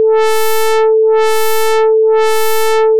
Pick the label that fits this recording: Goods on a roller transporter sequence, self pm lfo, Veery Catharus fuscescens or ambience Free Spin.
self pm lfo